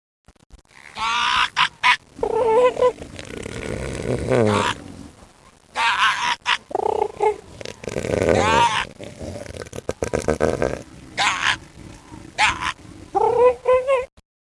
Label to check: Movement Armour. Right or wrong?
Wrong